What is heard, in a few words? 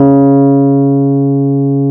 E PNO C#2; Synclavier Sampler Library